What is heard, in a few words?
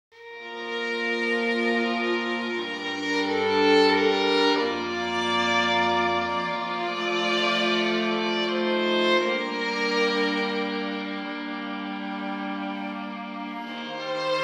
Ave maria Schubert Violin